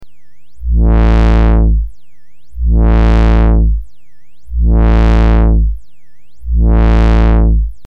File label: clock noise, synth